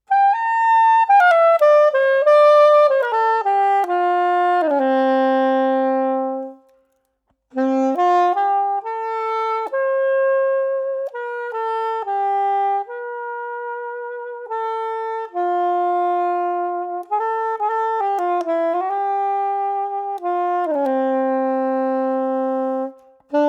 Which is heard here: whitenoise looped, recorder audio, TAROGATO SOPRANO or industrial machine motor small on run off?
TAROGATO SOPRANO